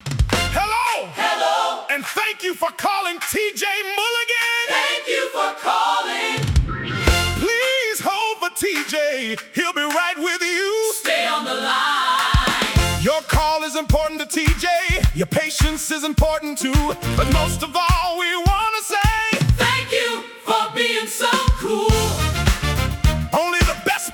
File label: Gospel Funk